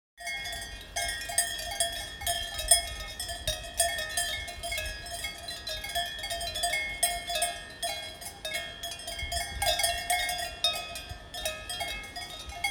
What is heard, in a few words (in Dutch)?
koeien